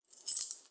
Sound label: Coins